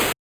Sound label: electronic snare 8bit; soundscrate